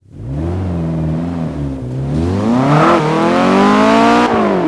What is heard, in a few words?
first, vehicles; gmod; tdmcars